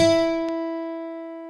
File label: lyra; theodyssey